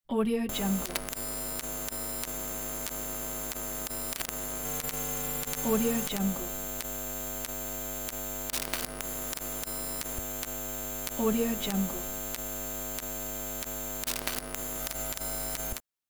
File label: static interference noise